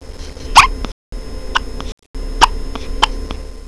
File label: hicups